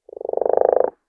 fruit Hit; feed the frog

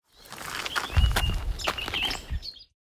sheep walk; sentence tumblers

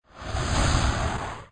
jetpack Take Off; robot collector

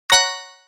bonus; skate flyer

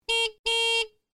horn; duck racer

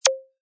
draggable Lift

